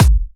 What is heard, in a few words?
Bassdrum; Vengeance Samples; VEE Bassdrums